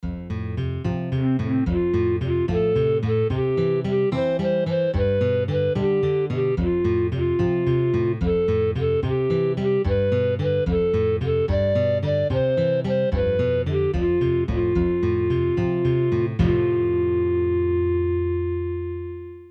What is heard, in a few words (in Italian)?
rusariu vausu base